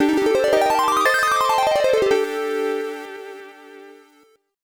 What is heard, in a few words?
Harp; Brandish VT; hoot; PC-98; Nocturne